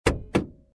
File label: Car Door Handle